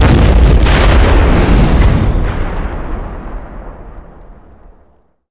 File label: large explosion; SpecOps2